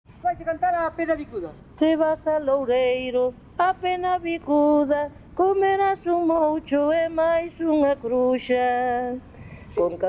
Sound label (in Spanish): Canto Infantil